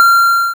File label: Pickup Coin; cliff-hanger